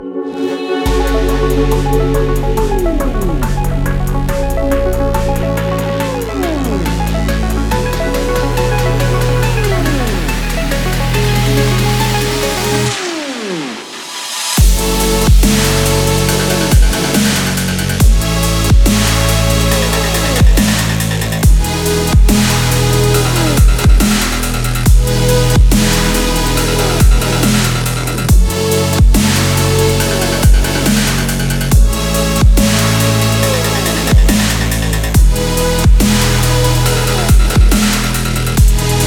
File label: the extreme sports dubstep